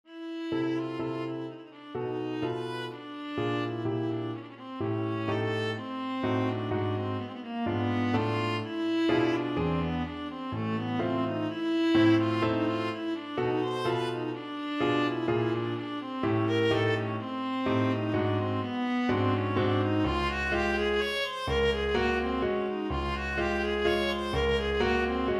mazurka, viola

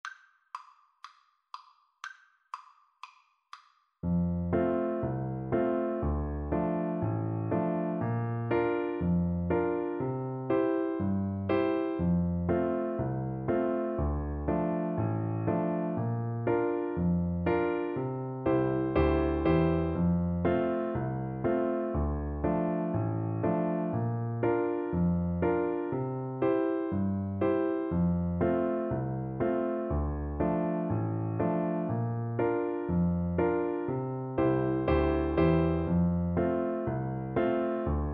mairis wedding, Bassoon

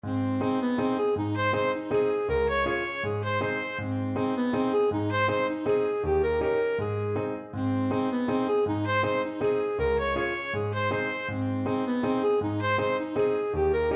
ASAX, saxophone

